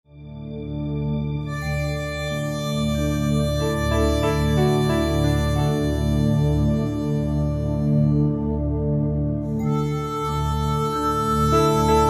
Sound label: Harmonica